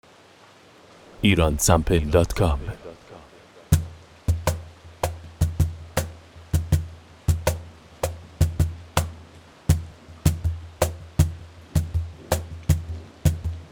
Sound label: Cajon